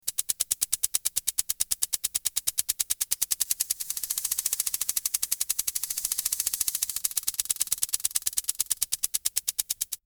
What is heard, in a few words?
Sprinkler